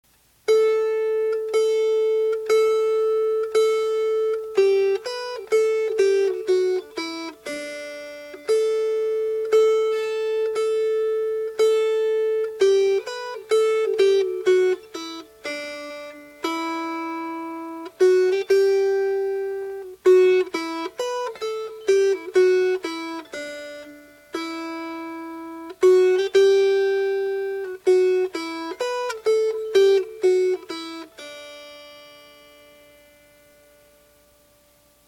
Melody; Duck of the Meadow

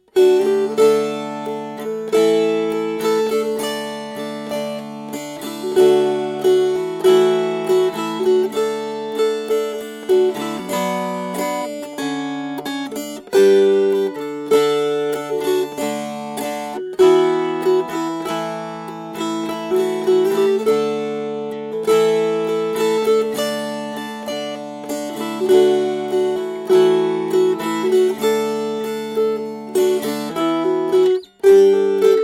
Strummed

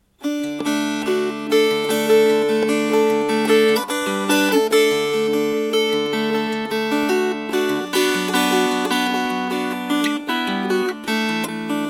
Fingerpicked

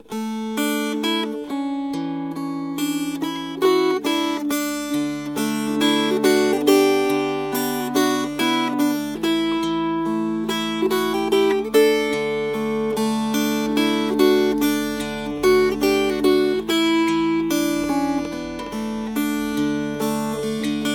Fingerpicked

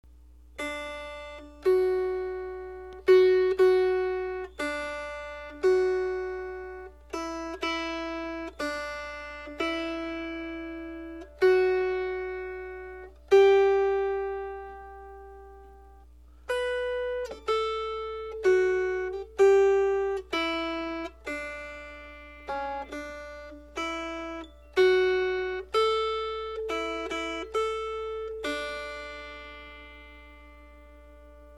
Melody

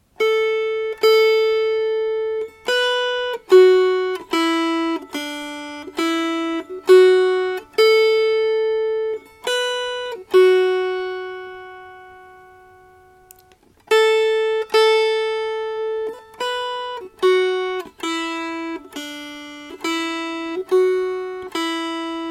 Single Girl Melody

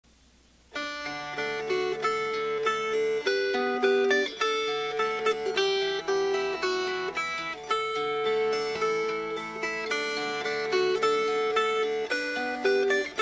Fingerpicked

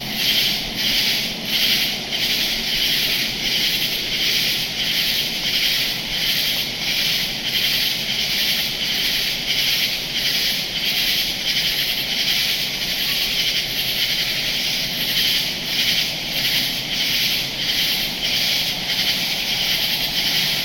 North Carolina Cicadas

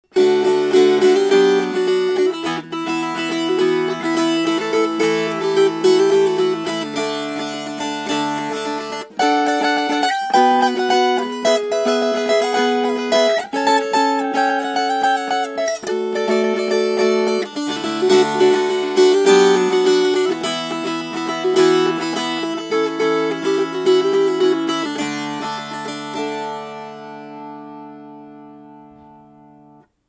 Salty Dog Fast

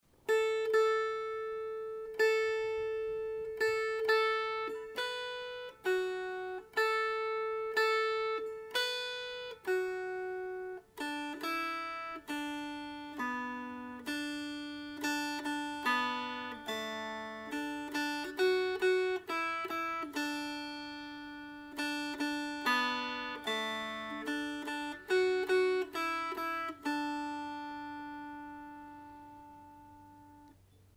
Walk Along, John Melody

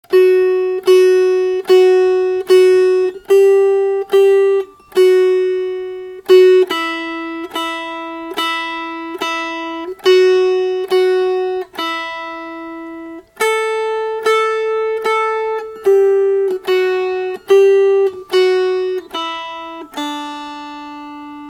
Salty Dog, Melody

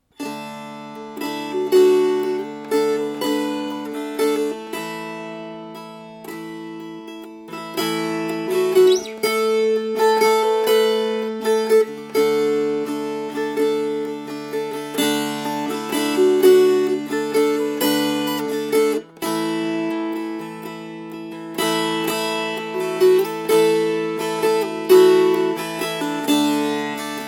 Strummed

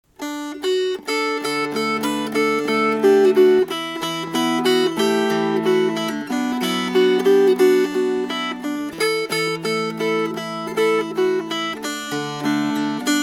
Fingerpicked; Cosher Baileys Engine

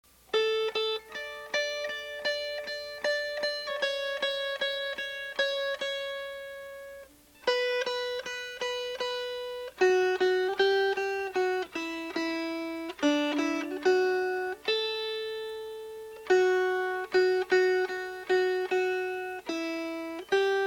Melody

